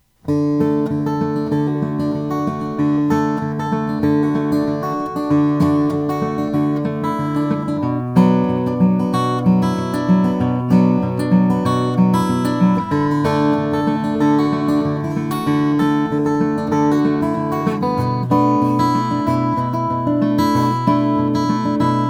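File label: martin mc 18 custom mahogany; woody mann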